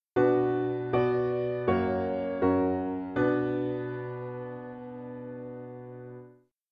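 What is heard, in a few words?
piano, dictation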